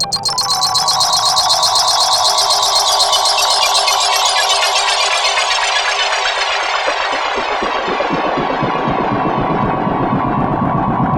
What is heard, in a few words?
FX SWEEP, SYNTHSWEEPS